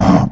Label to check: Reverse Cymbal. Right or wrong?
Wrong